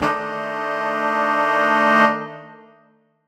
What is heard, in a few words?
Horn Swell Aminb5; undercover samples